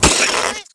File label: Enemy Clicker Damaged